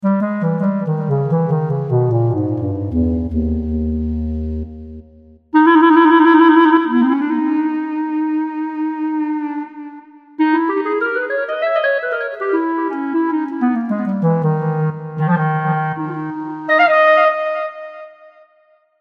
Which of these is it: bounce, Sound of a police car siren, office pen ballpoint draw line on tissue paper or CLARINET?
CLARINET